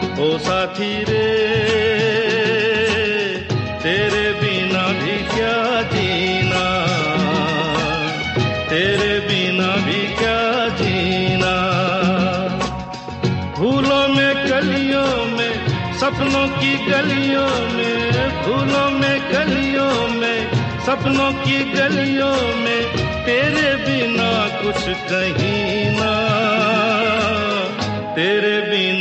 Old Hindi Song Ringtone

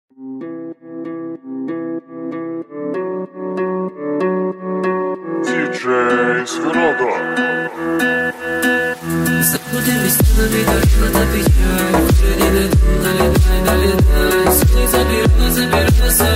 Heart Touching Hollywood Music Ringtone

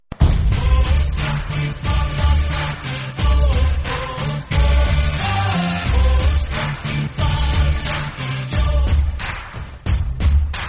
Telugu Ringtone

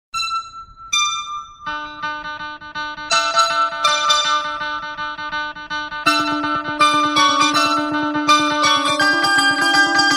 Telugu Sitar Instrumental Ringtone

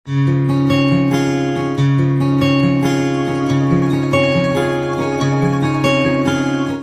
Piano Instrumental Ringtone

